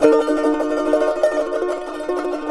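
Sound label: charango E2 minor tremolo; Spectrasonics; Hans Zimmer Guitars VOL-2; CHARANGO CHD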